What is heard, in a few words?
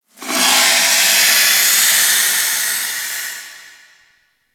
Infested Distant Breath; Warframe; Corpus Infested; Ambience